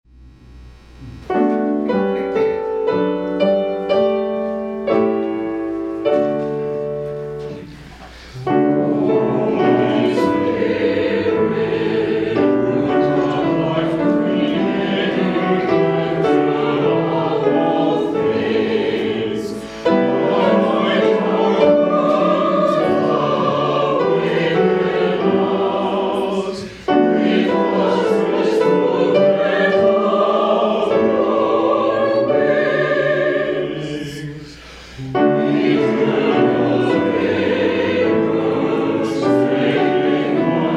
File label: hymn; O Holy Spirit, Root of Life